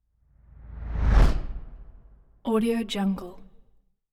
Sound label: whoosh